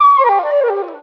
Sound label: flutist